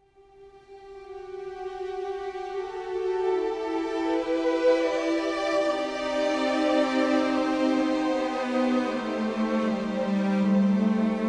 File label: Classical Radio; Farming Simulator 22